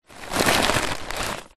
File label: food popcorn paper bag full hand in remove